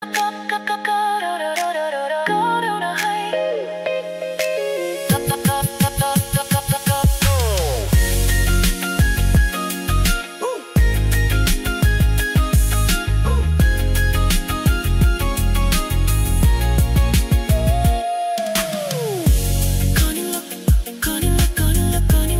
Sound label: remix